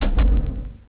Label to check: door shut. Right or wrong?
Right